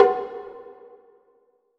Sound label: incorrect